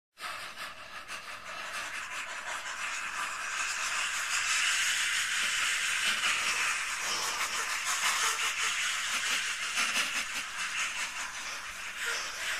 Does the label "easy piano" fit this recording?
No